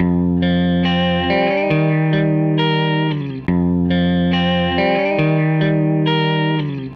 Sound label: Les Guitar Loop